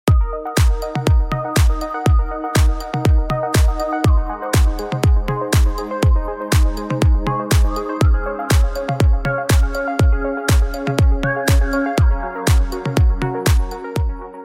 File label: soft and soothing tine